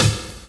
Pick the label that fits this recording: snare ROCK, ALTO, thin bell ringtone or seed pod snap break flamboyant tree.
snare ROCK